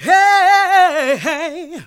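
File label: Gospel; Best Service Gigapack 1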